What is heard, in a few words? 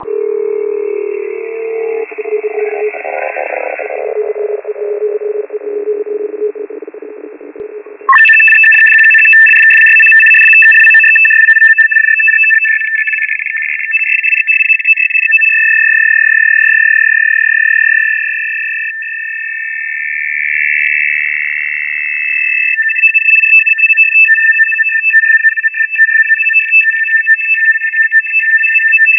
RTTY